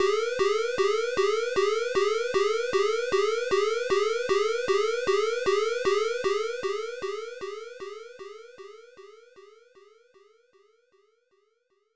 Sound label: Warning; ryukiheidan danzarubu; snes